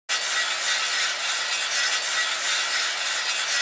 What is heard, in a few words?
cup Loop